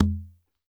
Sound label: WD R, DRM HITS; Spectrasonics; Liquid Grooves